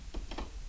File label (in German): holznagen, octodons